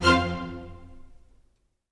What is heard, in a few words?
Music Generic Marker Strings